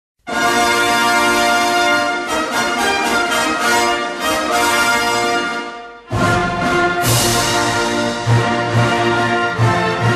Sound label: Tavisupleba instrumental